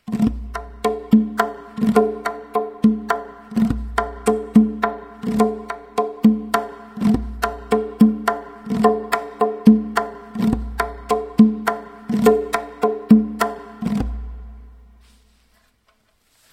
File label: tonbak